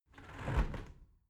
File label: window close tilt